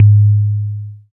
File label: BAS FM DX-7